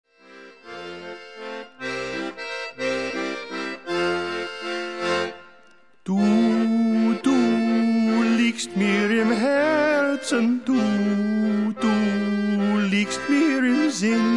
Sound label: instrumental; Instrumental With Singing